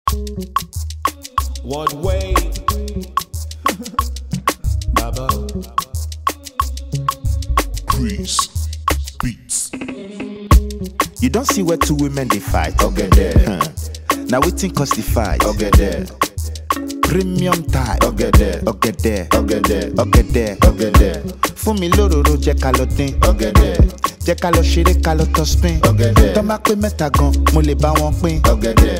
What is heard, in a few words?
Instrumental